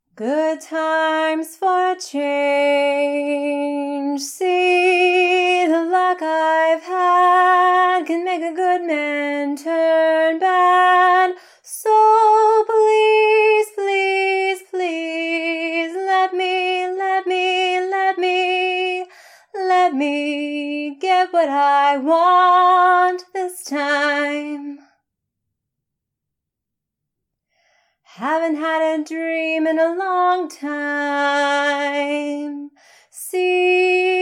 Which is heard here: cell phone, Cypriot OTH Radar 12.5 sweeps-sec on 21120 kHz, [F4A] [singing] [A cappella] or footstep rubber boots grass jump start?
[F4A] [singing] [A cappella]